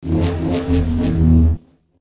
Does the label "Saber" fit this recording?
Yes